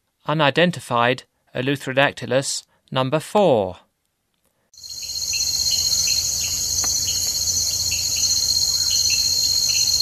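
Eleutherodactylus species, Frogs of Ecuadorian Amazon; S. America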